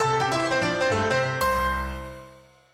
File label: piano award; BALLY; jingle; TWILIGHT ZONE; Pinsound; Twilight-Zone-Full-Reorchestration